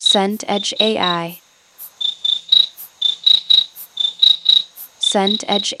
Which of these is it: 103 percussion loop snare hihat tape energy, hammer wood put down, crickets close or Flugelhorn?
crickets close